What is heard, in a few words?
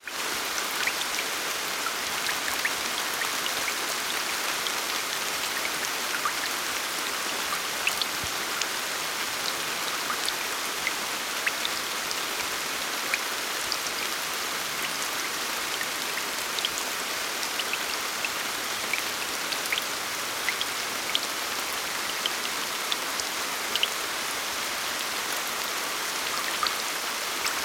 rain